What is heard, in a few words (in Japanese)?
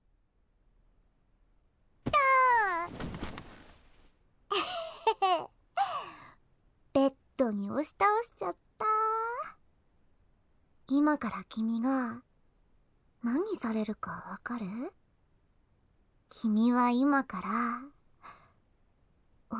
お耳のお掃除, ASMR; SEあり